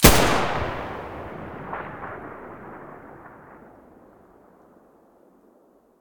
muzzle g36 stereo, weapons

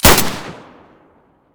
m9a3 muzzle stereo; beretta

